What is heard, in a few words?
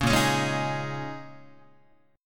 A sharp Minor 11th A sharp down Guitar, chord; strummed; JGuitar